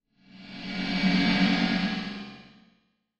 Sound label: Alarm Comp Dig Metal; alarms